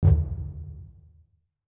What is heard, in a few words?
spin btn; netent